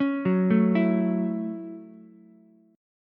A 6th, Ukulele; arpeggiated

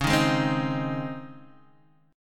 Db Major 7th Suspended 2nd Db Guitar Open D

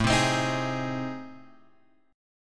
A Minor Major 7th Flat 5th; AmM7b5 Chord {5 3 X 5 4 4} strummed

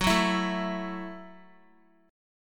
Gb Minor 7th, Guitar; Gbm7; strummed; x,9,6,9,9,x

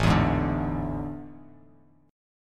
A Major 7th Suspended 4th; chord

